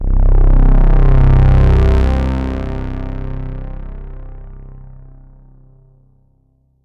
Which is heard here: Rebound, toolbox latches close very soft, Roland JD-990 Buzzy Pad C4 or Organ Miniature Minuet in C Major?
Roland JD-990 Buzzy Pad C4